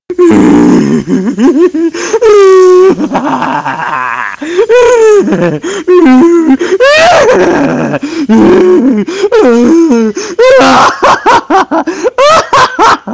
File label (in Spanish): muajaja